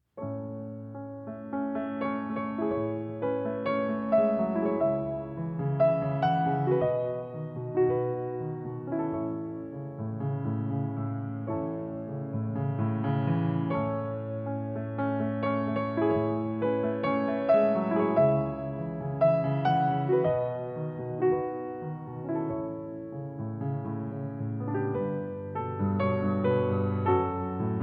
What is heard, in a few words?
Trailers Music; Resident Evil 6